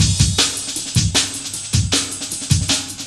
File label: Break, Loops; M8